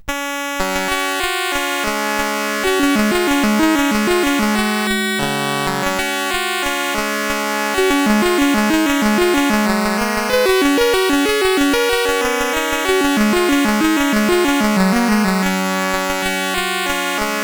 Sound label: sid CSG8580R5; Agent Orange